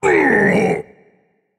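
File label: non-verbal ouch; smite; atlas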